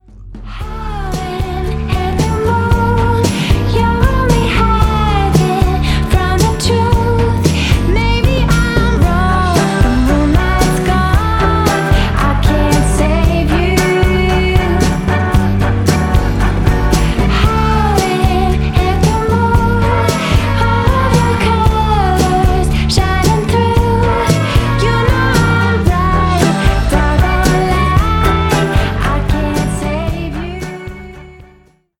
indie pop, vocalist

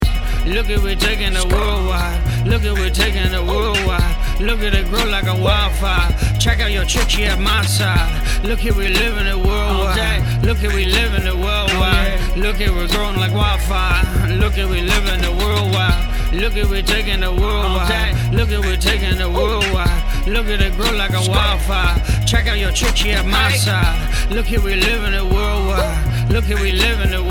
Mumble Rap

